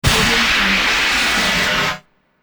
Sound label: trash can spin; sound-ideas